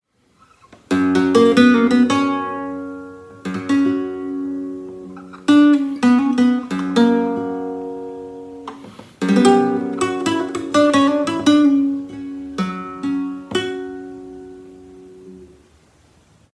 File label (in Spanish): solea